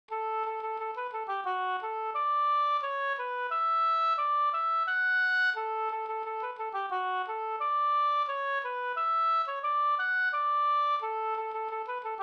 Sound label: polka polkett